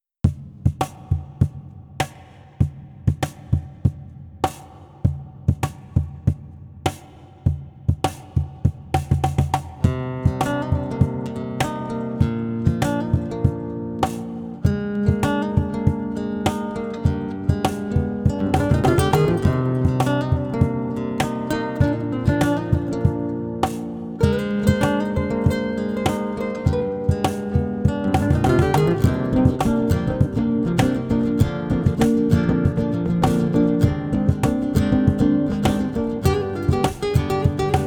flamenco vibes 432 hz; puerta del sol

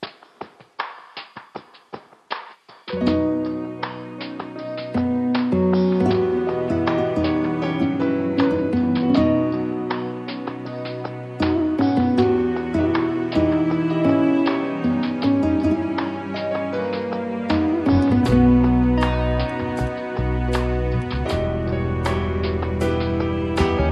Breath Epic Tranquility

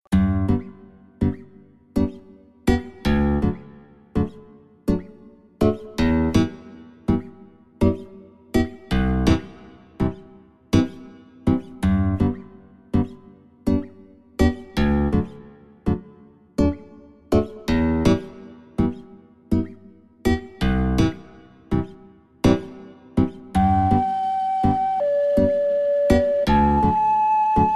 hawaiian guitar, 432 Hz

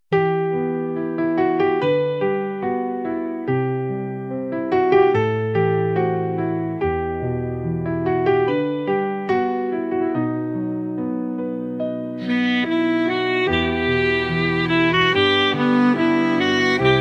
smooth sax journey

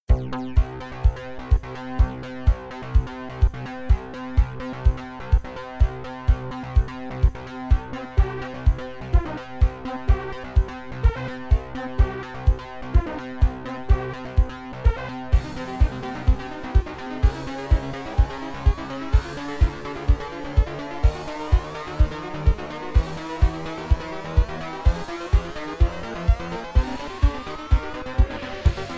fitness music, 432 hz; 126 BPM

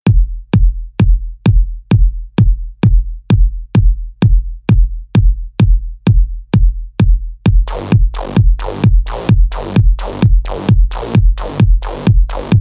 techno trip, fitness, 432 hz

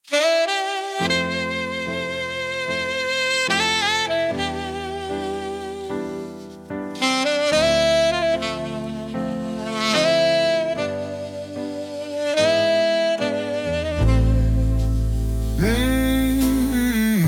velvet saxophone

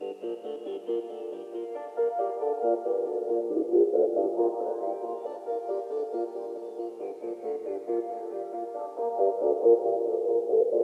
enigmatic dance 432 Hz

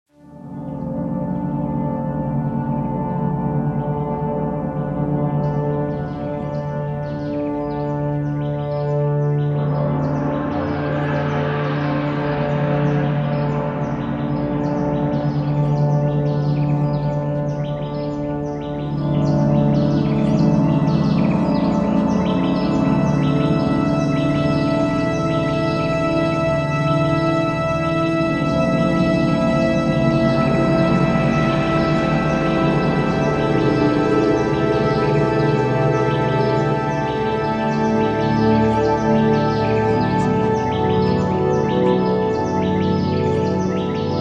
ambient with nature; swietlista polana

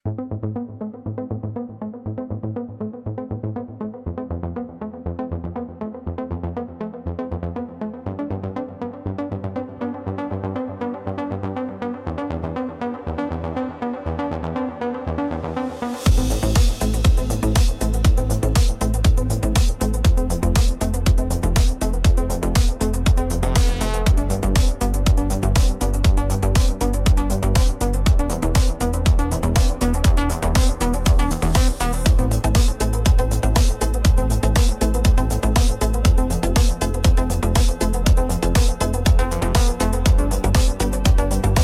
Electronic Impulse

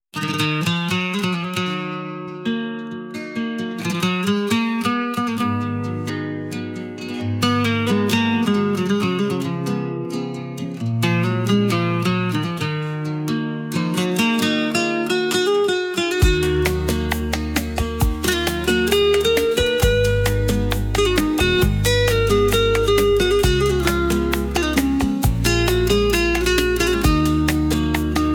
flaming strings

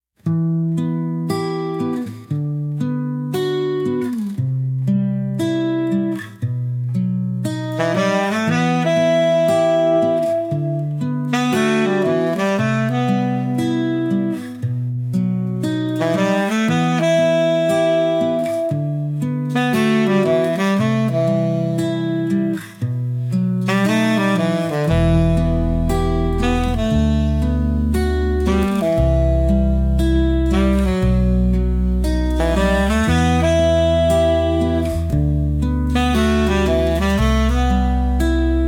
saxophone dreams

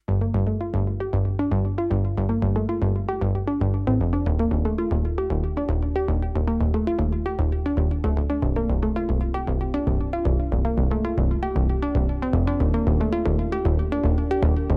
Electronic Impulse

